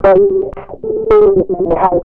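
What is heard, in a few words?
rhythm loops, 110 bpm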